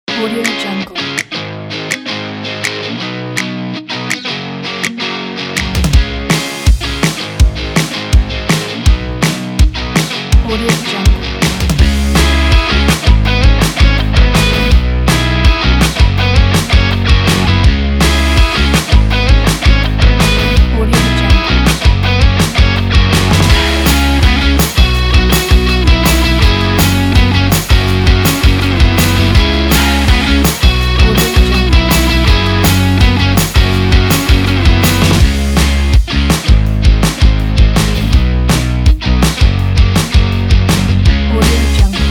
uplifting energetic rock; magpiemusic

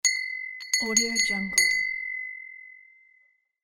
low captain bell

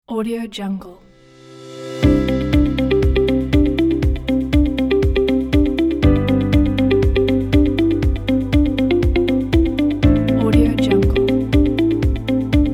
motivational inspiring advertising background

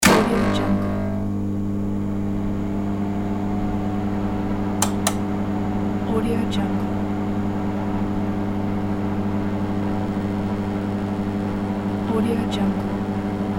electronic amplifier on

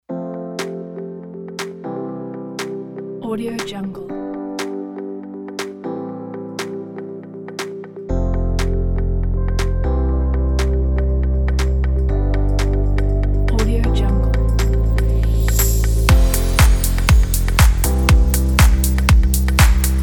fashion lounge

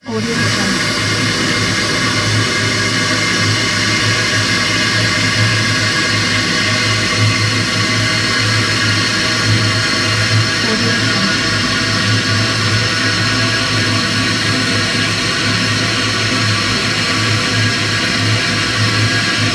electric engine

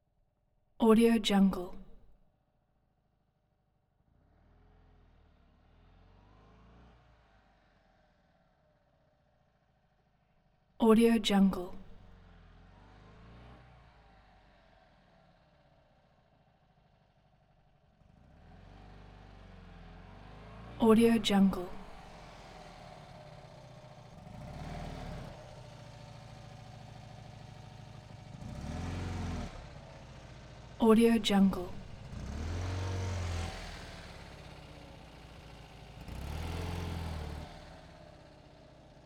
fiat 500 car pass by with multiple revs; 1969; prosoundfx

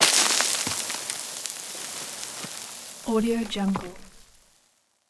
rupture sand impact; soundmorph

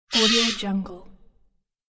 jay bird screech; fxprosound

